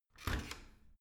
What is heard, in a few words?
washing machine open squeak